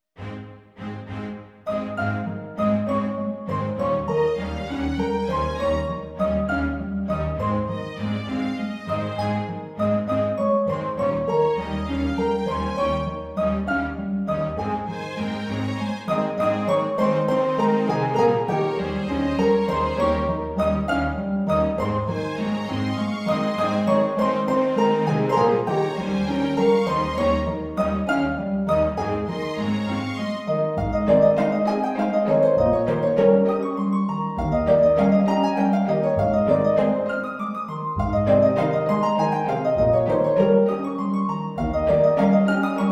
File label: harp; venice